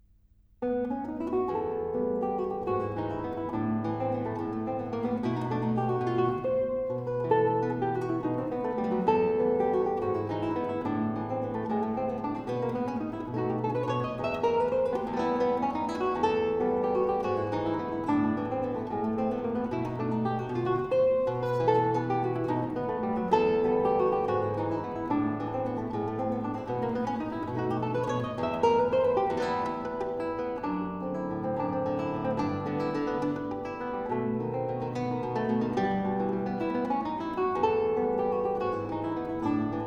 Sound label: Venezuelan Waltzes for guitar, in E minor; Music from the Novels of Louis de Bernières